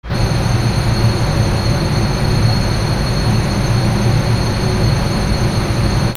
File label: INDUSTRY